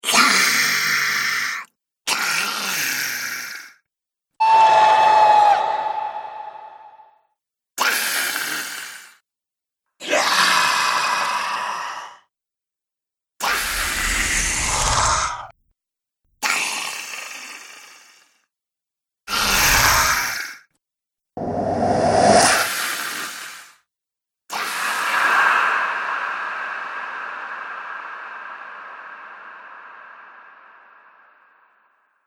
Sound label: Scream Vampire Soundpack, monster; Monster Sound Effects